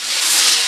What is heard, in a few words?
Circle Brush, EMU Proteus-2000